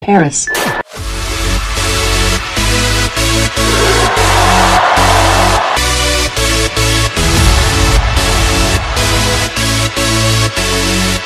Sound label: trance romance ringtone